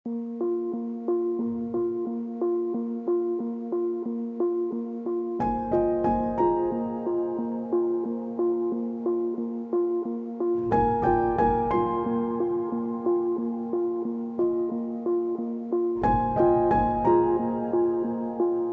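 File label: piano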